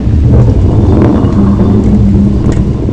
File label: bowling roll; SuperTuxKart